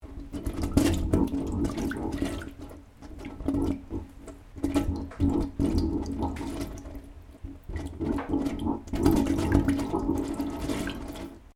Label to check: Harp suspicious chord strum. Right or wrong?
Wrong